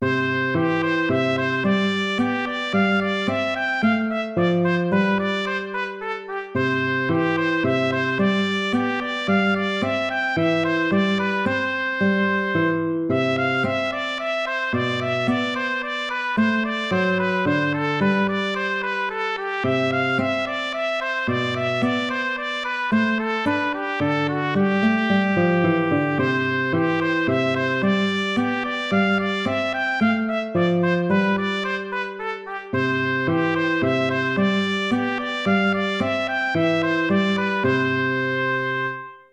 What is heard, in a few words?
tempo minuetto; hook james